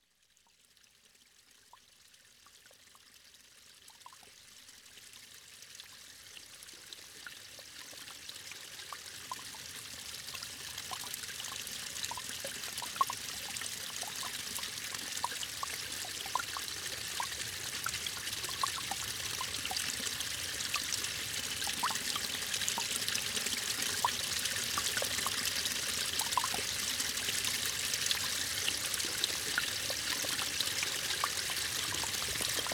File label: Mountain Stream, Nature Sounds - With Music